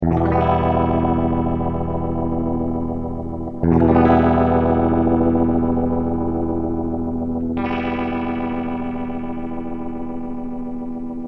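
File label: clean electric guitar voce spin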